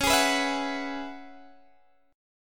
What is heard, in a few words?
C sharp Minor 11th C sharp, Guitar DADGAD